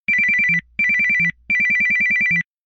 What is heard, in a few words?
Single Tone, ringtones, Retro; Samsung Galaxy Z Fold 7; Sound Theme